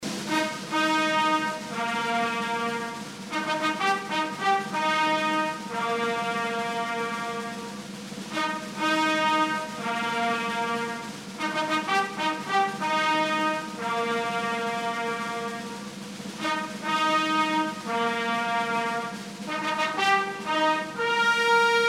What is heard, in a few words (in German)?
Zapfenstreich